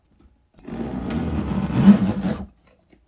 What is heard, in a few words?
Toolbag zipper close